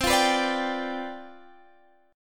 C Major 7th Suspended 2nd Suspended 4th, C, down, Guitar, Half Step Down